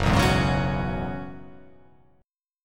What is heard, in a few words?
F sharp 9th Suspended 4th F sharp, chord